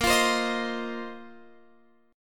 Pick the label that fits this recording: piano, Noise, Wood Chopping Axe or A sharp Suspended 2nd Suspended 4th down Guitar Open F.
A sharp Suspended 2nd Suspended 4th down Guitar Open F